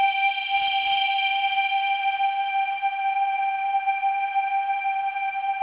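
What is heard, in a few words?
atmosphere; Sound Gallery - Charged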